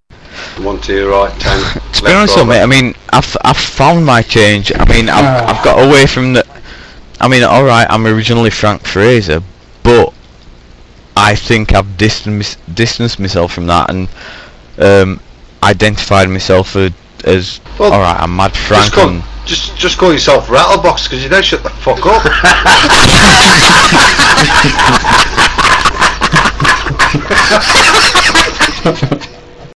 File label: rattlebox